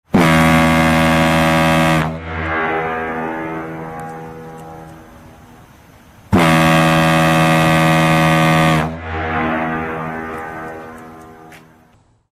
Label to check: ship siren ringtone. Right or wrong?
Right